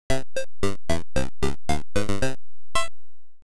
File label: Game Over; wario land